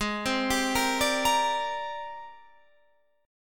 G sharp Major Sharp 11th, Guitar Drop D

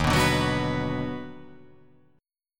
D sharp Minor Major 7th Sharp 5th

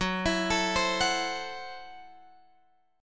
F sharp Minor 7th Flat 5th, Guitar Open F; arpeggiated

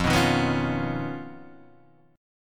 D sharp 7th Suspended 2nd Sharp 5th F

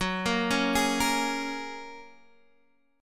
Gb Major Add 9th, down, Guitar, Half Step Down

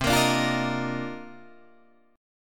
C Minor 11th C Guitar Drop D

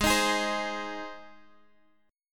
Ab Major Ab, Guitar DADGAD; 18,18,18,17,x,x; down

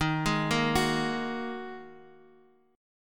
G sharp 7th Suspended 2nd D sharp

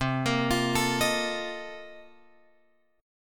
C Minor 13th C

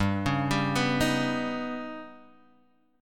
Eb Augmented 7th G

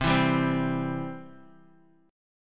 C Major; strummed; JGuitar

